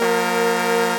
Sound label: Countdown Single; The Loud House - Extreme Cardboard Racing; NextlevelGames